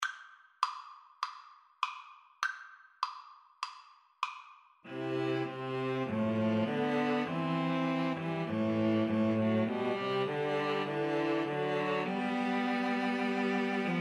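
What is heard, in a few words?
string trio karaoke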